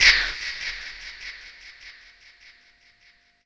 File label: Synth Perc; Dream Zone